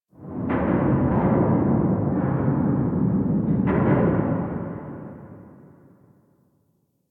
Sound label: Distant Eerie Metal Bangs; Warframe; Ambience; Nineteen Ninety Nine